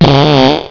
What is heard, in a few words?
blower, farts